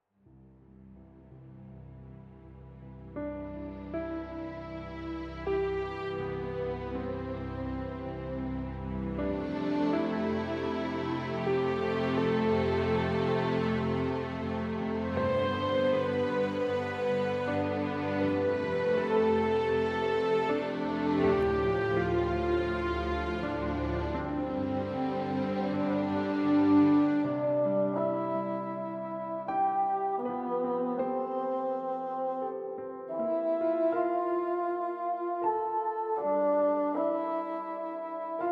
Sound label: Romantic theme